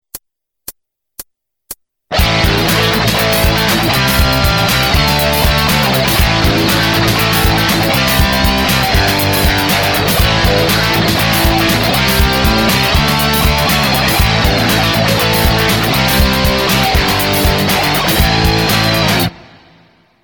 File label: penta jam, guitar